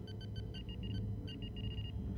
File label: Ops Sounds; trek